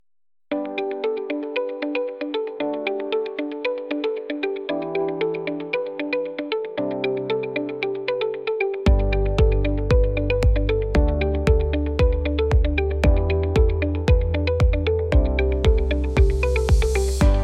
Atmospheric and introspective electronica

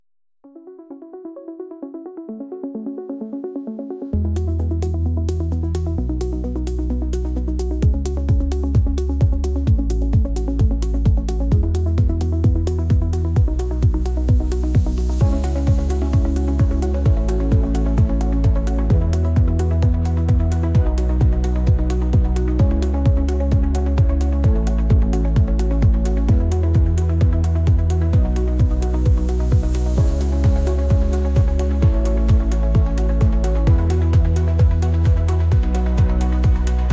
Techno Tango

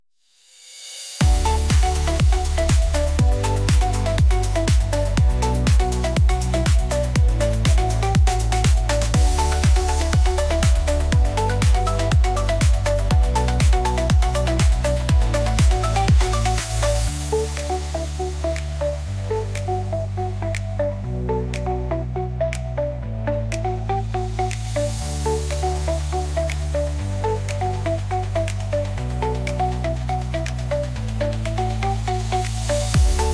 Glitch Groove

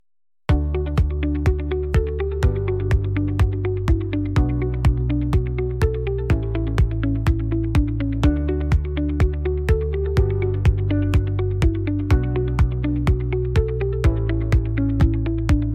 Holographic Hymn

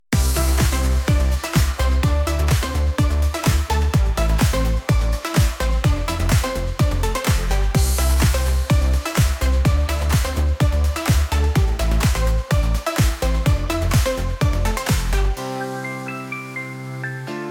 Techno Tango

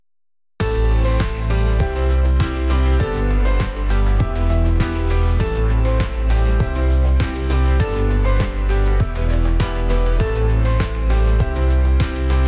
Techno Tango

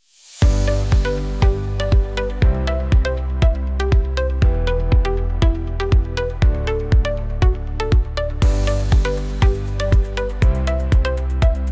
Synth Sizzle

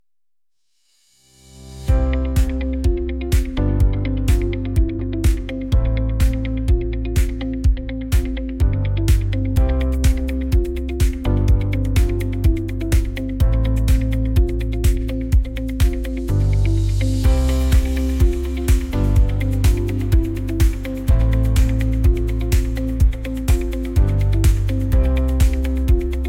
Future-Funk

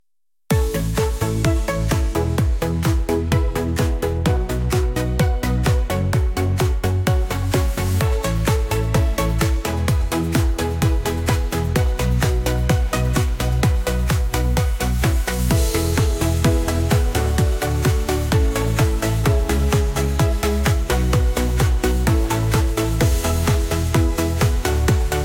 Synthwave

